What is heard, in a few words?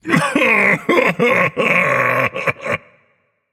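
non-verbal boohoo; smite; atlas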